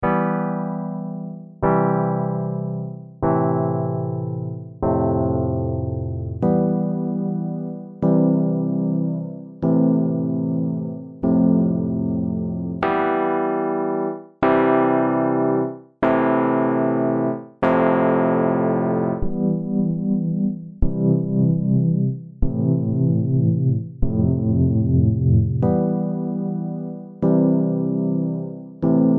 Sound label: Rhodes; King Of The Rhodes